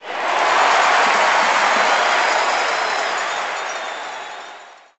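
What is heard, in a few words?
Basketball Crowd Reaction; Crowds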